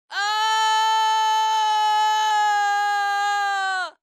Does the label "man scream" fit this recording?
Yes